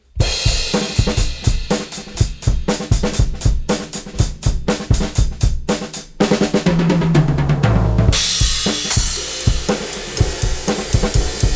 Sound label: drums; Gem EQP